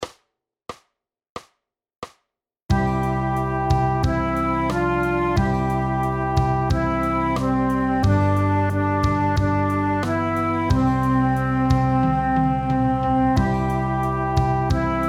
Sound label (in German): Hansel und Gretel playalong